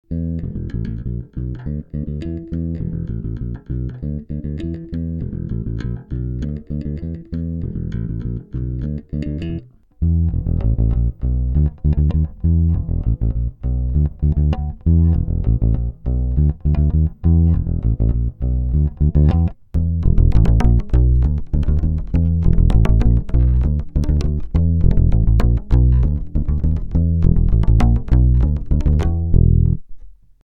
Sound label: DI motown